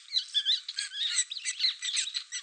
rats; ambient; creatures

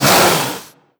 hiss long, headcrab reviver; creatures; vox

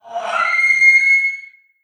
waiting call, headcrab reviver; creatures; vox

